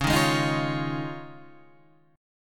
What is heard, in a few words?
F Minor 7th Sharp 5th C sharp